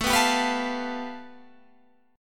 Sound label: A 7th Suspended 2nd Sharp 5th